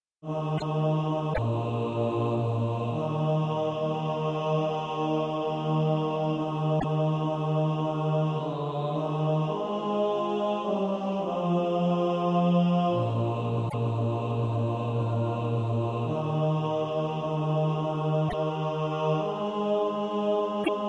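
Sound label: bass, SATB Practise Music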